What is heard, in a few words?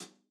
hi-hat